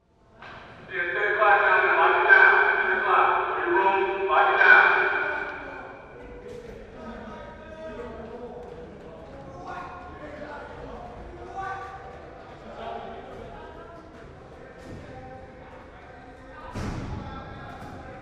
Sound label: Prison Inmates Lock Down; Crowd; Emergency; Crowds (Sound Effects:Backgrounds)